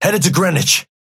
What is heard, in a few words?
Synth ping headed